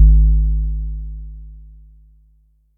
Sub, Electric Kick, Yamaha DTXpress

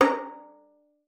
FING A#5, VIOLIN; Xsample VOL-5

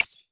Mega Drums, Korg 01W

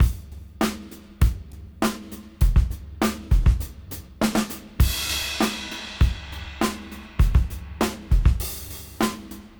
Old School 101 bpm

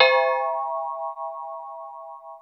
carillon, tonal percs; Crypton - DrumBase5000

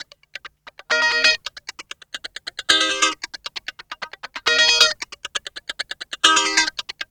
GUITARS 135, House

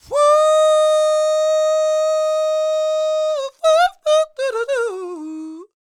SING, Vocal Planet Blues & Soul; CW SOUL

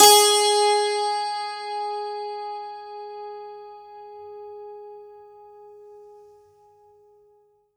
STR, SANTOOR

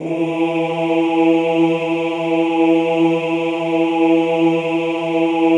OOH E2, Classical Choir; Best Service; Extended Classical Choir; OOH MIX

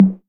TR808 Tom

